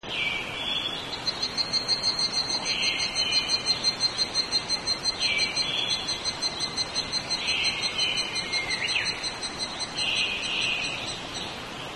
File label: higurashi